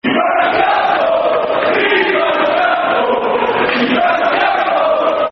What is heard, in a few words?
Football Chants (Soccer Songs); Ivan Campo; Bolton Wanderers Bwfc